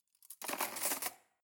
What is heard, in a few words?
cutlery cake forks drawer place soft